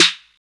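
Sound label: snare drum, Hard & Loud Techno; USB Soundscan vol.01; AKAI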